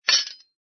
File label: METAL DROP